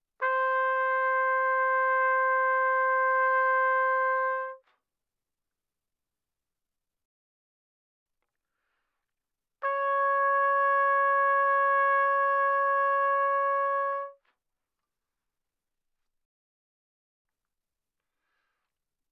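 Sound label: Trumpet no vibrato pp C5 B5; Brass; Bbtrumpet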